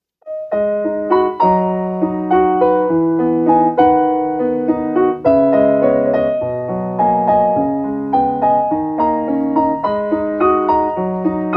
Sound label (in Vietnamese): không lời piano